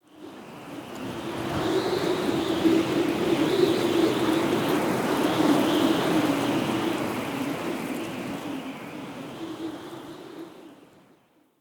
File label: Under City Wind Gust Strong Whistle Rope; Ambience